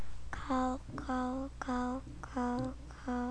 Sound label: cow